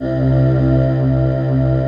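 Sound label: slow orchestra pad, Slow Pad 5th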